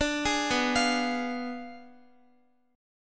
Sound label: B Diminished 7th B down Guitar; arpeggiated; tuning 4F,4A,5C,5G,5C,6E